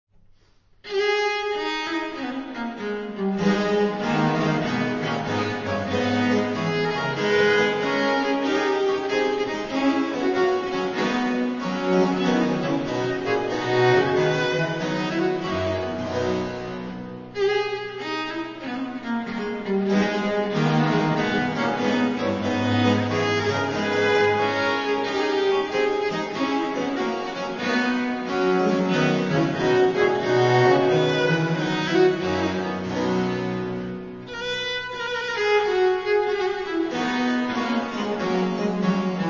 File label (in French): Gigue, gout français; Marais